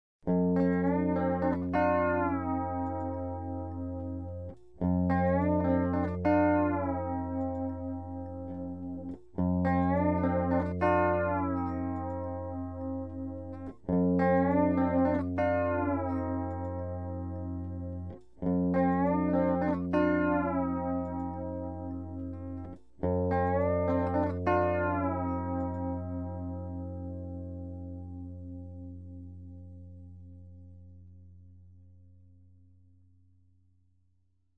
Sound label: riff, steel